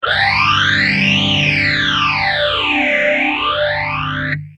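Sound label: guitar boss ph3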